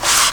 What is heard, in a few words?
Matchbox Slide